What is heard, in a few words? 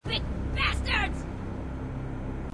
grunt; h3dialogue; grunts